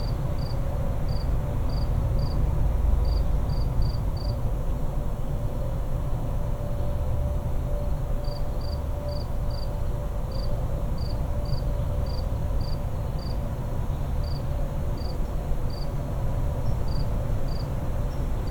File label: Suburban din night insect rumble howling; boom library